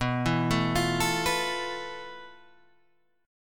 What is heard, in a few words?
F Major 7th Flat 5th B, guitar chord